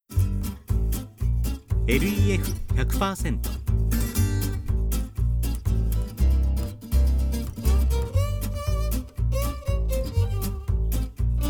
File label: guitar